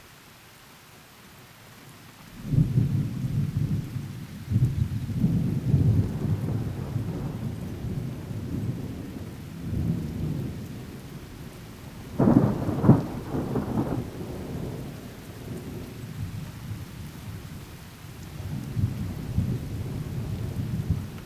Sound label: thunder light rain; best service Blue Box